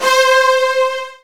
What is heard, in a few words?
Virtuoso Strings C3; ILIO; Kirk Hunter Virtuoso Strings VOL-2